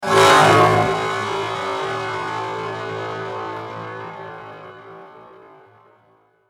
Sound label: Trance Sweeps; VEC2